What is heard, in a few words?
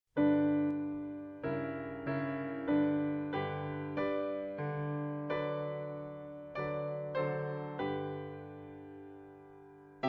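anthem piano accompaniment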